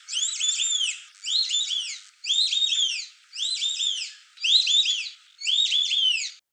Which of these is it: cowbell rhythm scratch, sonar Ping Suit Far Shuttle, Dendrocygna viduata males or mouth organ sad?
Dendrocygna viduata males